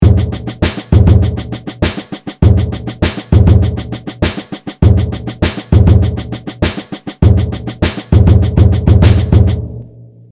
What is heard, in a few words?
SURDOS; Karla Shnikov